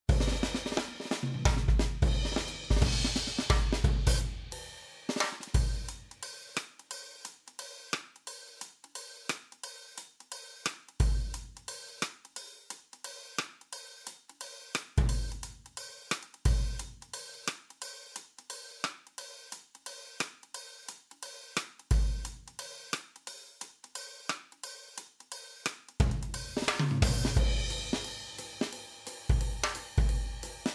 Swingin Drums

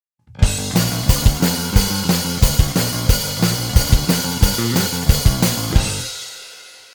Fast Bass

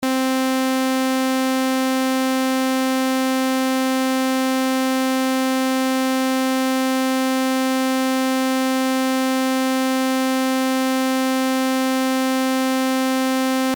Massive Dry

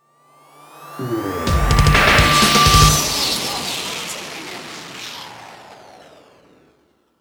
Fill All

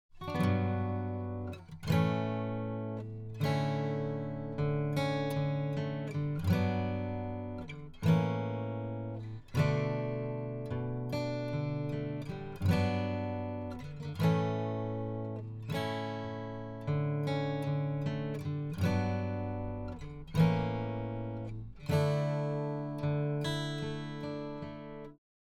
A Gtr

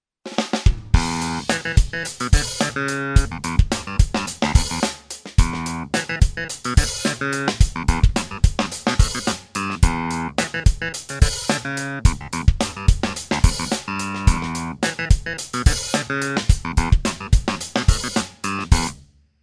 Clavi

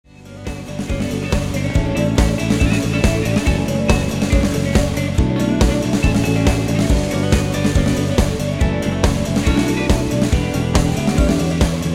EG Distortion Mixed

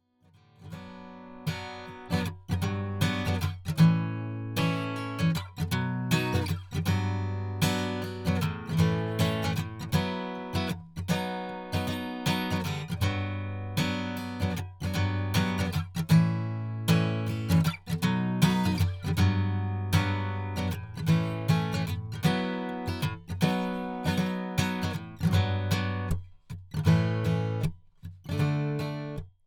A Gtr

